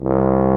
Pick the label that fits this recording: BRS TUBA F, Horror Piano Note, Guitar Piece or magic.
BRS TUBA F